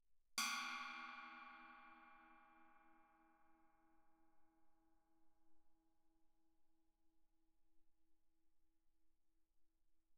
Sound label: chinese stick normal mf, suspended cymbals; Percussion